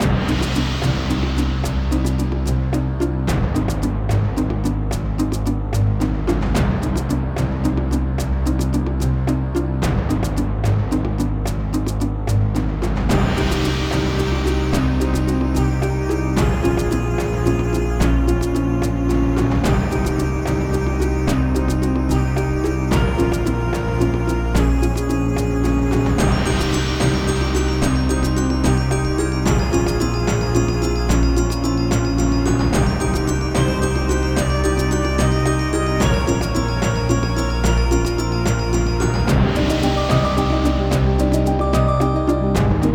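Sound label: background music map; ovenbreak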